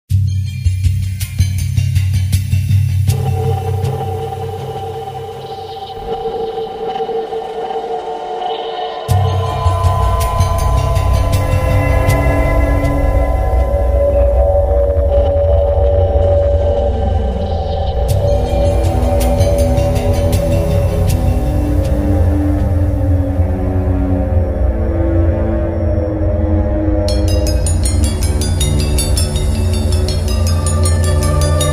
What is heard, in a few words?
ambience music